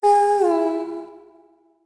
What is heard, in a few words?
Vox Hum; kingsraid